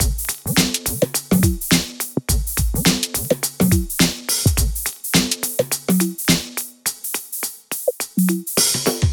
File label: Drumloops; MixterPlus